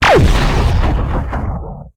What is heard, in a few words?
Large Mech Gauss Rifle